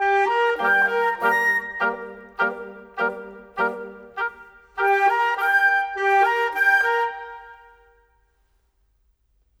Winds, Orchestral; Sonic Foundry (Sony Creative Software); Orchestral VOL-2; Modern 32

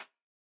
hats C, hip-hop drum kit; 99Sounds x Monosounds; Moon

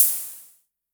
Hi Hats Open; LIMAT Div Dept

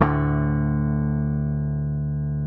D, Bass; Sampleheads; Will Lee Bass Library

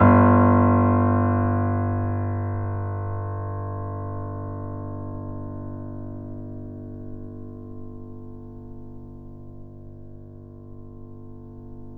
Mp G 0 off, Grand Piano off mic; AKAI S6000 CD-ROM - Volume 2

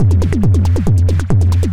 percussion loops, Techno; Techno ID

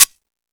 Timbale, E-MU Pro-Cussion

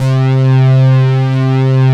POLY PAD SYN; Pro-Rec - Synthsonic; Z1 PADS

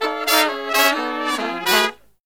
Killer Brass Riffs

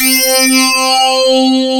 pluck C4, FM pluck

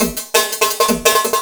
Pitch 170, Drum&Bass Breaks; EZ Rollers

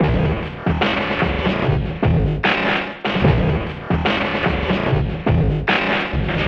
OLD FUNKST; Distored Reality

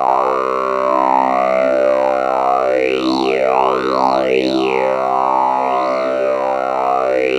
C2, SynthLine

